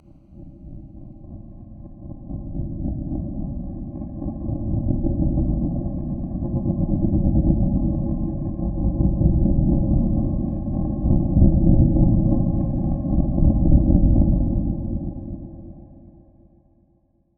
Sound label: Ambient Echo